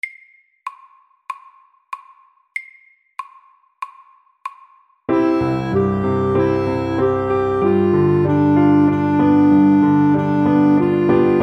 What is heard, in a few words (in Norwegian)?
Walesisk melodi MEDIUM